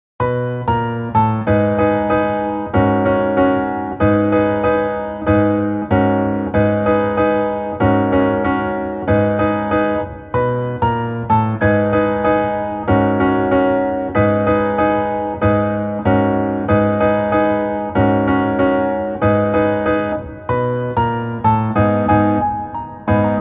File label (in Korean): Piano ver, 멜로디MR, 여자키